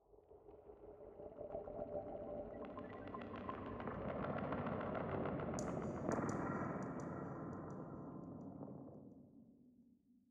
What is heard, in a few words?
Basic Low Beat 85bpm